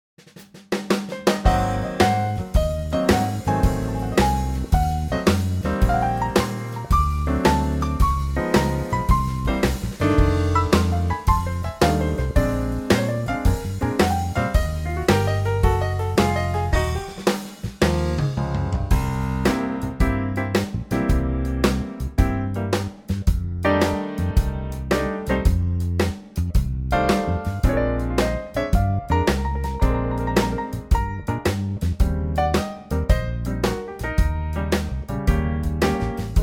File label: Trio